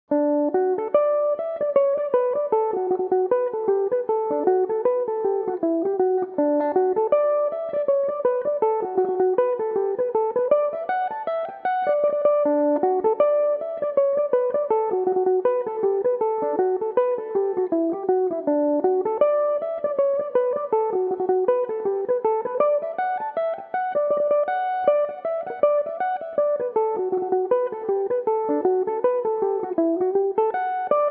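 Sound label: reel D major, Irish mandolin; John Brennan's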